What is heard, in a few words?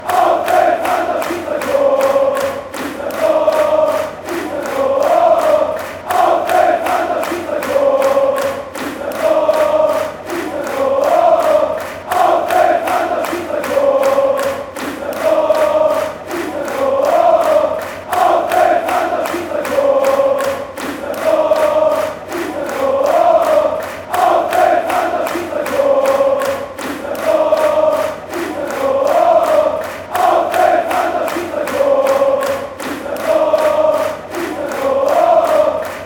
goal, fanchants